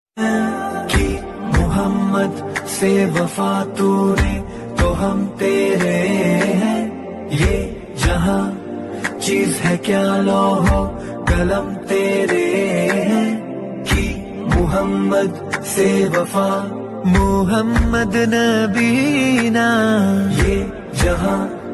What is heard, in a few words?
islamic ringtone